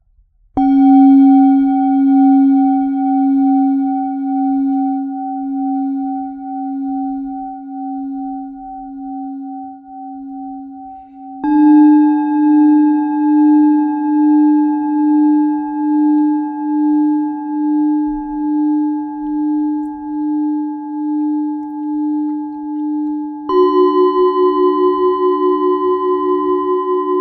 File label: Harmony Cup Set